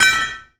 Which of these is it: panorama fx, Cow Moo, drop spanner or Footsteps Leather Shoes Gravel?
drop spanner